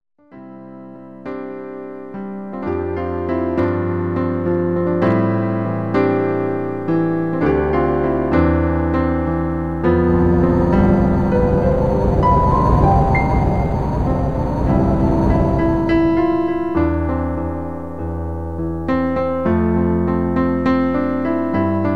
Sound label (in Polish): Pani zima instr